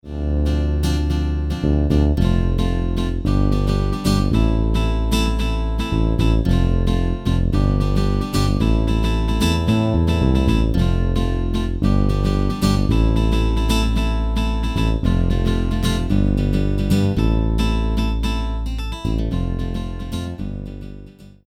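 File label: Bass Guitar; 基になったベースとギターの演奏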